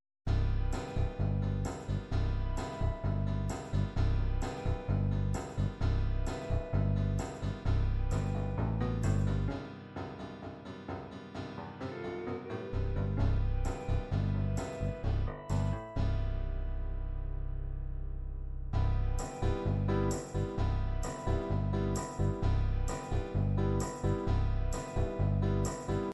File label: Country Rock, midi